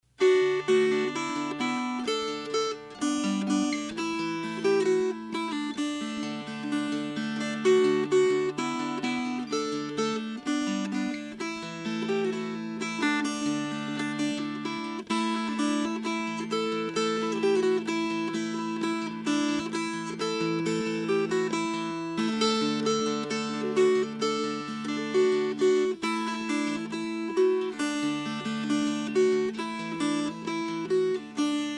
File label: Fingerpicked